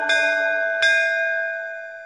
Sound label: Temple Bell Ringtone